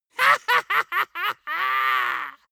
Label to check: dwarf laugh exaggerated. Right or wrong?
Right